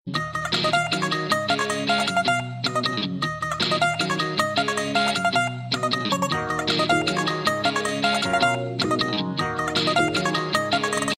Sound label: Nice Guitar